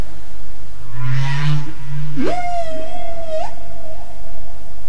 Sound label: whale